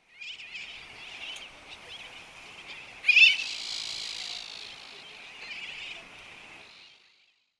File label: Seguroajisashi, Taketomi